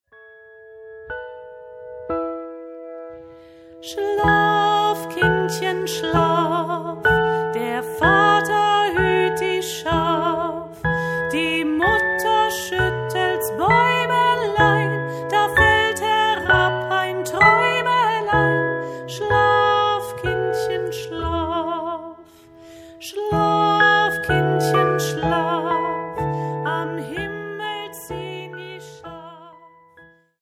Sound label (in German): Schlaf Kindchen schlaf